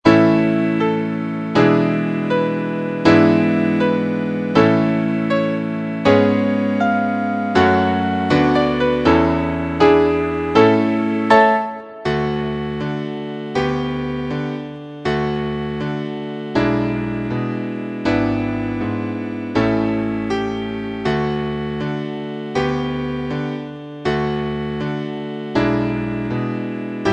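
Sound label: accompaniments, Trumpet in D; concone; Rudimentary Transposition Studies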